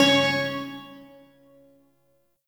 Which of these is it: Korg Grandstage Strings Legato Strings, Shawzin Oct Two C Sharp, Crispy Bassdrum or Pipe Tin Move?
Shawzin Oct Two C Sharp